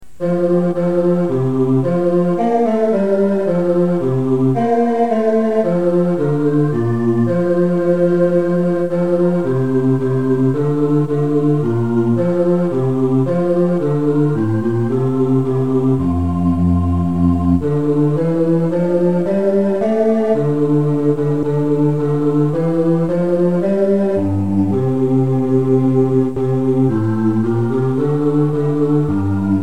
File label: it came upon bass; Christmas; carol tracks